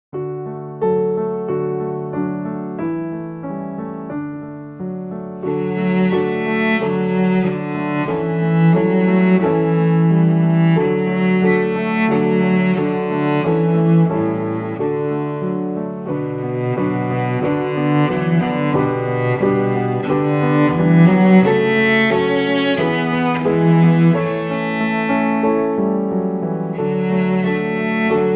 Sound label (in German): Playalong Cello Klavier